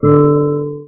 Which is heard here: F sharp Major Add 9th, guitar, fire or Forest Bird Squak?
guitar